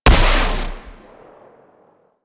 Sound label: Amp Barrel Fire, Amplifiers; Warframe; Operators; Corp Amp Set One; Barrels